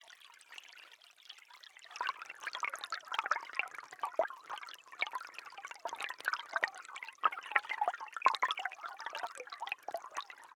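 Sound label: AMB Underwater Water Flow, Cave Design; InMotionAudio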